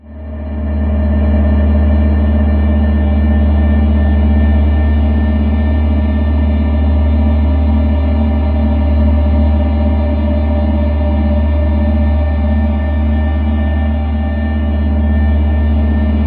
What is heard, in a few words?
SPACE, Ominous Drones, SYNTH SND; USB Soundscan vol.48; AKAI